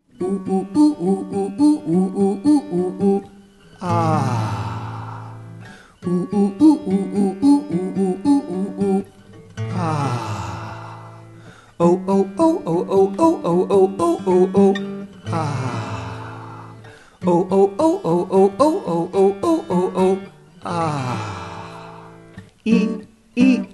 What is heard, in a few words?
Ooh Ooh